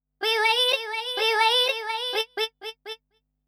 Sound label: VOICE GRL, Voice; eJay